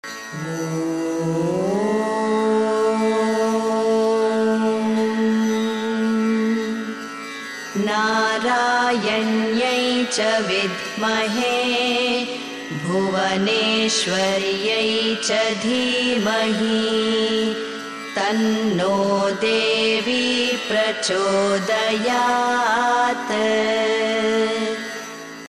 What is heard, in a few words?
bhuvaneshwari gayatri